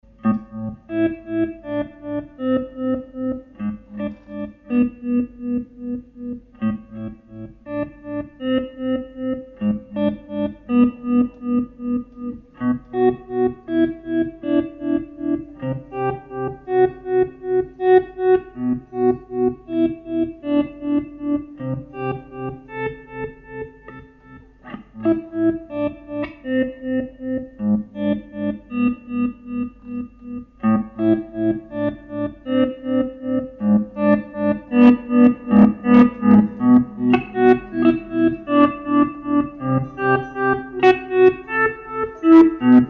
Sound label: moog